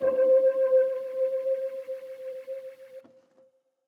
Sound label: KEYS Water - C, Synth One Shots